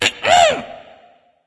clear throat; smite; voicepack; scylla

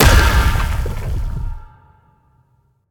waterball trident impact; smite; poseidon

